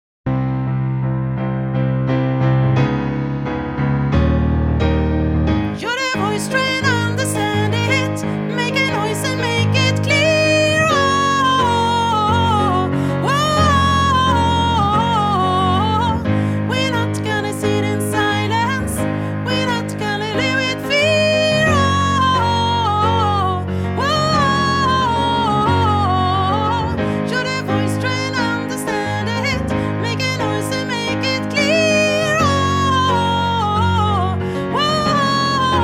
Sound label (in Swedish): sopran